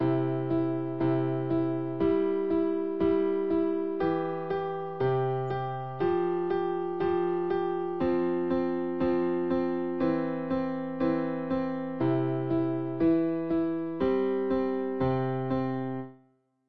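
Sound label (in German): Rhythmik Übung, klavier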